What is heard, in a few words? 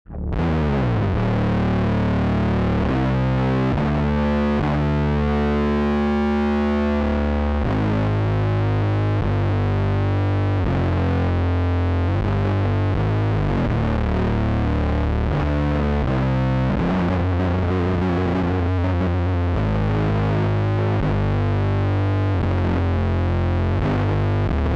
Stoner; Catalinbread